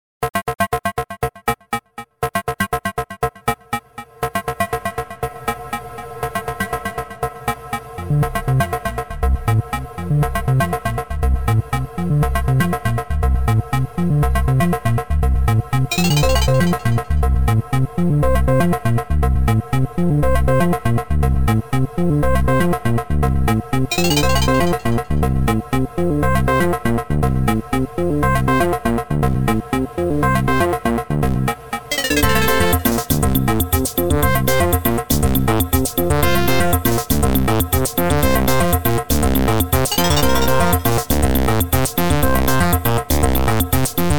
pizza dance, synthesizers

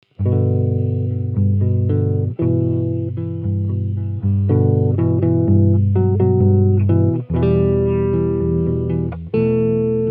soul love fingerstyle dry

